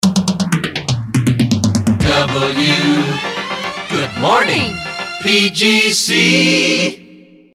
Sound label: jingles resings good morning; pams